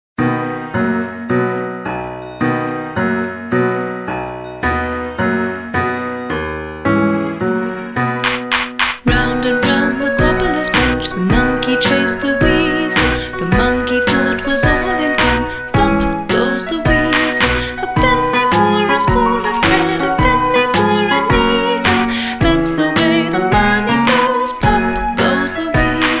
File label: Pop Goes the weasel, vocals; Singing Bell